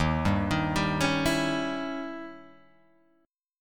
D sharp 7th Flat 9th, D sharp, down, Guitar, Open G